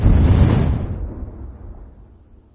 thumper dust; Garry's Mod; hl2

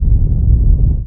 worm rumble; Emperor - Battle for Dune

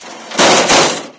roust crash, materials; Garry's Mod; hl2

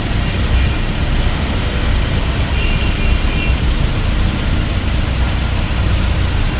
ambient traffic; AssaultCube; Alien Stars; American Muscle Series

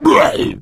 male zombie growl; voice; Left 4 Dead 2; boomer

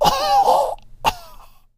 choke, survivor voice; Left 4 Dead 2

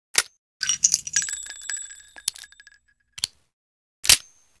webley Reload; Minecraft; Infamy; Last Alive Pack

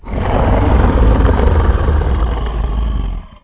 worm roar; Emperor - Battle for Dune

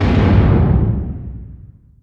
distance ship hit small effect; Hearts of Iron IV Death or Dishonor

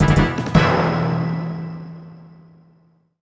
Victory; Sid Meier's Civilization 4 Complete; Beyond the Sword

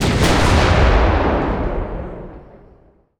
super heavy battleship attack; Hearts of Iron IV Death or Dishonor

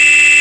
horn; AssaultCube; Alien Stars; American Muscle Series; cars; racers; IROC

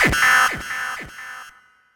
timestop; Alawar; Star Defender 4

